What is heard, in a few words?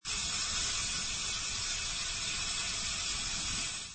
slide